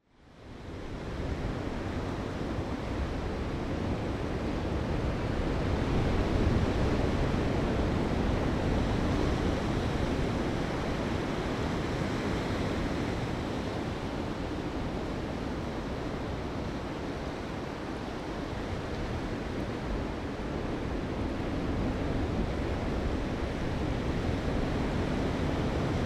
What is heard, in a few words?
oystercatcher